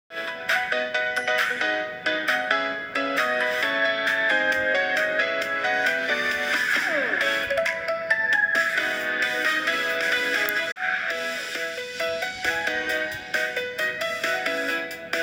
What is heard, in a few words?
pop happy